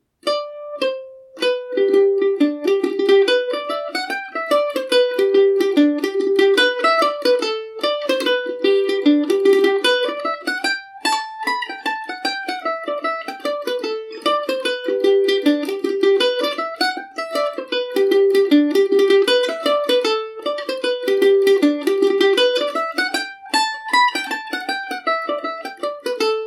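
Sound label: honeymoon reel G major, mandolin; Paris Swing “Macaferri” mandolin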